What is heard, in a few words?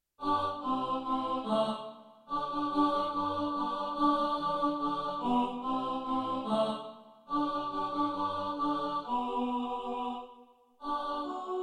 alto, choir